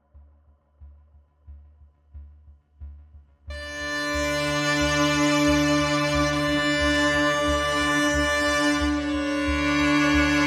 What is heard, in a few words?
Forest No Guitar